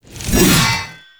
Backstab Impact; Warframe; PaxDuviricus

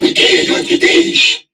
Surprised; Warframe; Corpus Crewmen; Crewman E; Dialog Barks Battle

